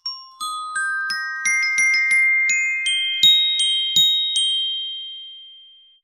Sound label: Den Glock